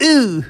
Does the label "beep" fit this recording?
No